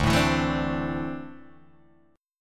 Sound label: D 7th Suspended 2nd down Guitar Open D